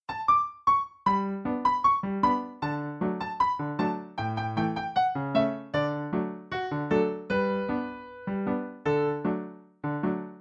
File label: tingalayo instr